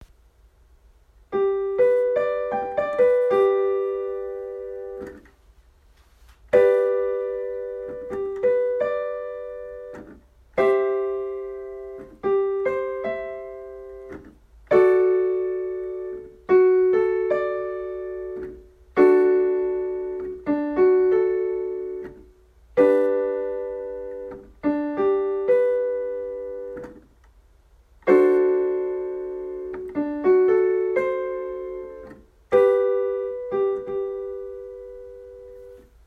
Аккорды соль мажор

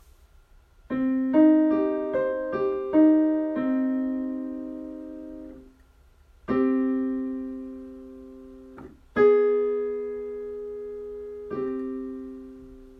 Интервалы

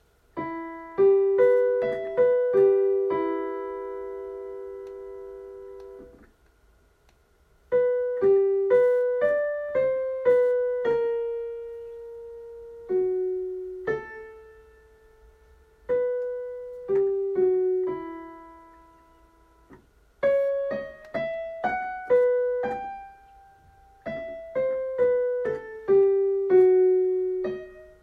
Диктант ми минор

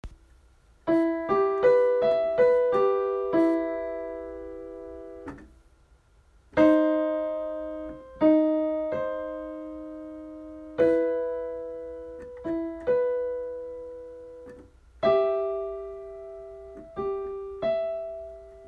Интервалы ми минор

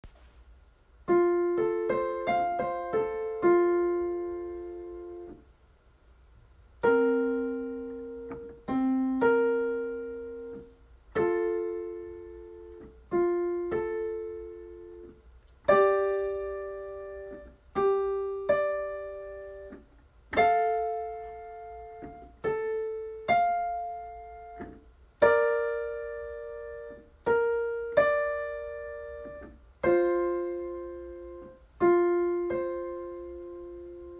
Интервалы фа мажор

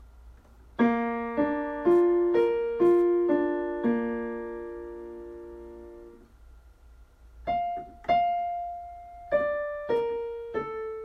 Диктант си бемоль мажор

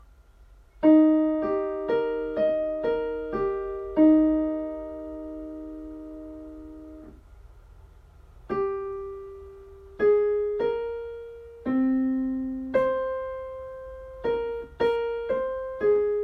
Диктант 6 класс ми бемоль мажор

